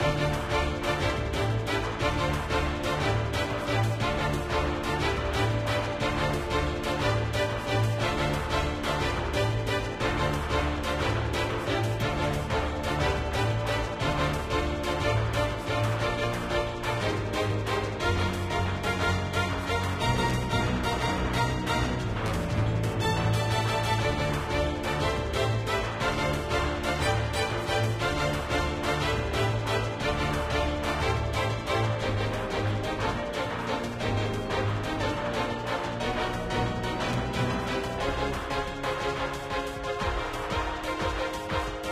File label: bgm raid boss; princess connect; android game music